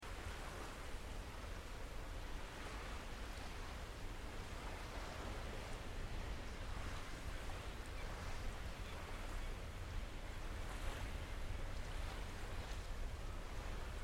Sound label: UK dock; 3dstreet